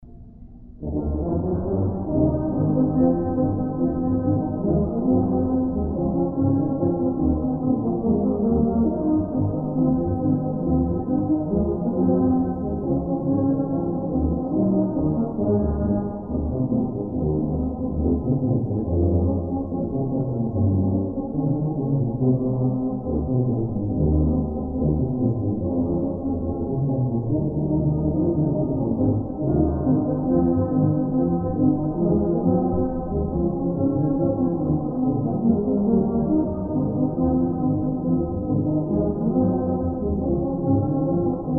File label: Jingle Bells, Christmas Tubas